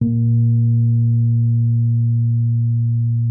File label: RHODES MK1P A#2; Xsample VOL-1